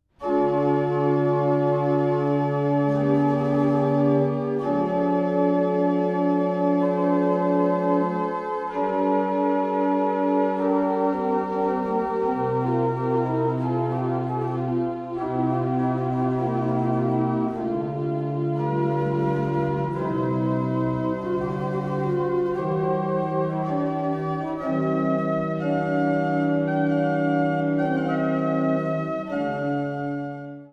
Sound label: Toccata III per sonarsi alla levatione; Secondo libro di Toccate